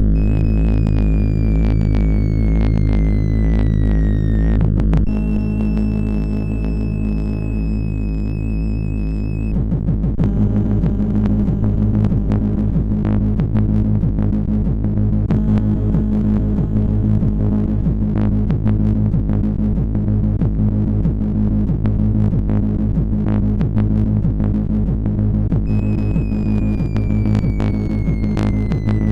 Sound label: Monotone sid MOS6581